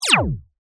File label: Laser Gun Fire, Shot